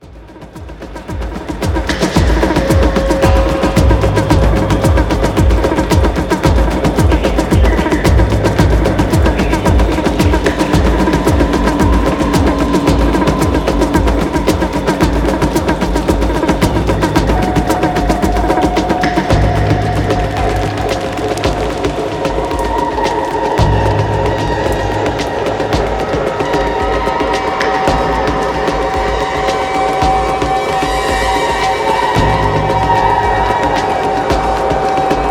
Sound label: Space Drum Meditation